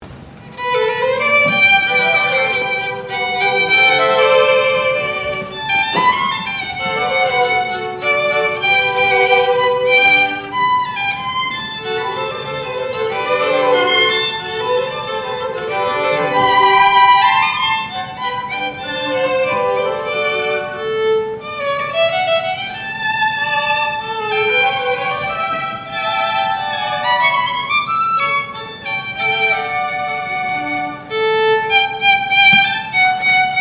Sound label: trio, Violins; Allegro; Guiseppe Demachi: "Trio I" (Allegro) from Three Trios for Three Flutes or Three Violins; Collegium Musicum